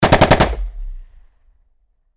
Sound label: gunshot; gunshot.mp3; shooting-gallery-pack